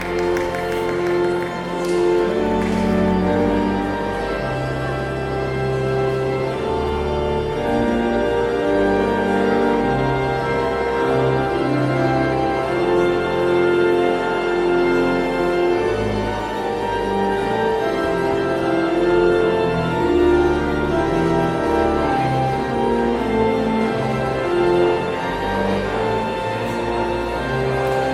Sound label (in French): Orgue